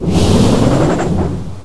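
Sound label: door open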